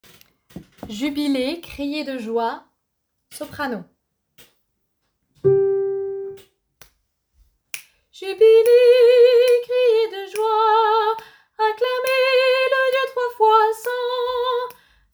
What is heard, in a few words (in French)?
Jubilez criez de joie soprano